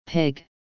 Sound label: pig, farm animals